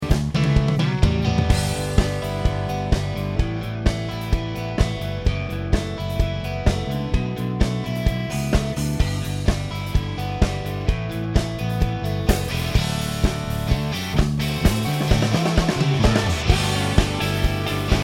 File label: Dr Bass EG